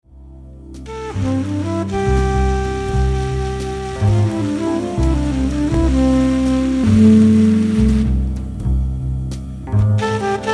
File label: Song; Gotsu Sepia